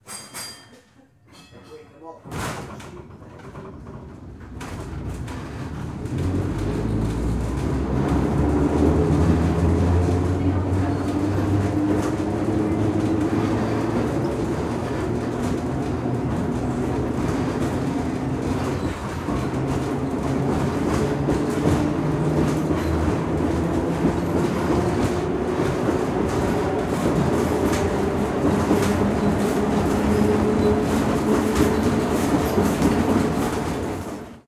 Street Car (Metro Tram) - Vintage - Car - Interior - Ride - Depart; Soundiron; Subways & Streetcars